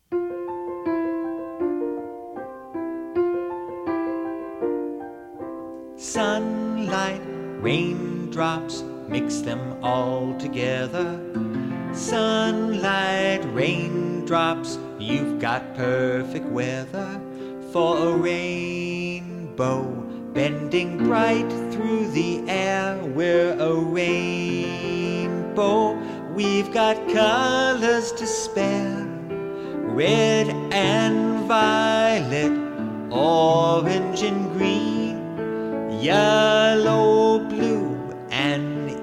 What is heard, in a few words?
we are a rainbow vocals